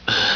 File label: butt laf